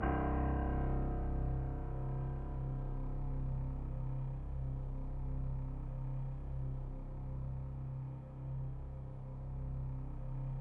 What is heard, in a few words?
C2, Synth Line